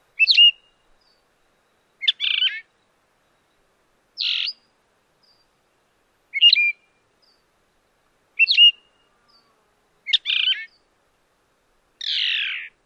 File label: song